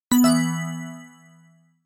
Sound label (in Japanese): PCレスポンス